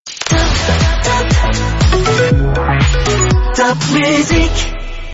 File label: jingle; topmusic